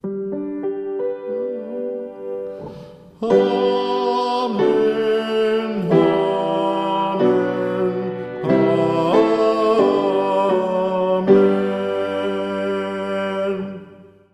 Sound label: 3-fold Amen Soprano, choir